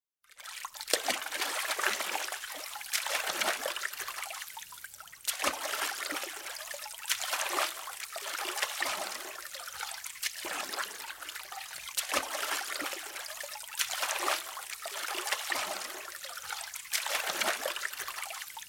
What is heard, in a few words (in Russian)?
zvuk vspleska vesel v lodke